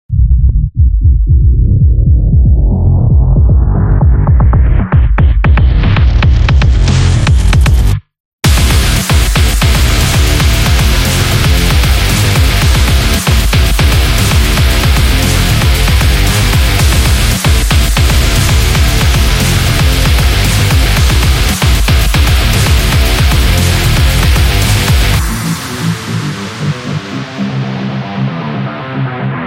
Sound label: Aggressive Sport, metal